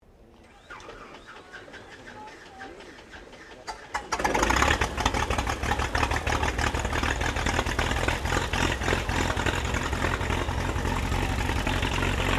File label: AIRCRAFT CESSNA O-1 BIRDDOG STARTUP; Cessna Birddog spotter aircraft; Airplanes; Vehicles